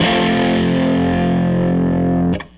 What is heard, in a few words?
Guitar Loop; Sonic Foundry; Six-String Orchestra